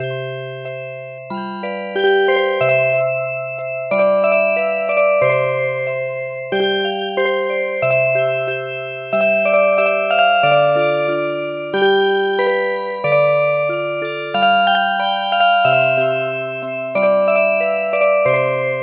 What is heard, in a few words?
Music Box; Apuse